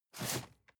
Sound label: cardboard box medium slide short hard; objekte